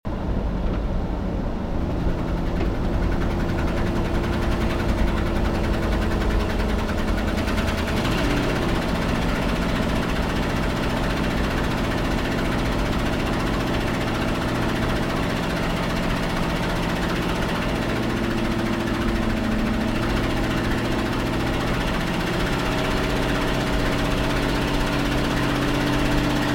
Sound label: Truck Contact